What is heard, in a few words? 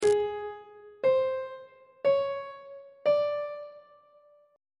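a flat diminished 4th perfect 4th augmented 4th intervals; on treble clef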